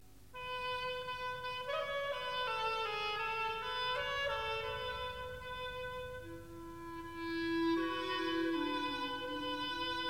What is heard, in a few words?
Recitative Narrator; Song of Songs Part II; Solomon had a vineyard